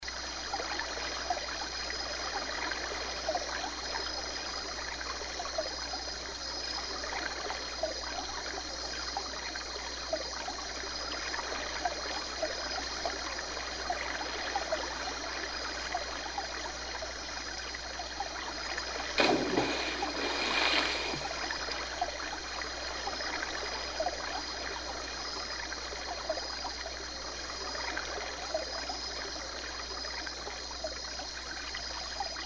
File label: riverflow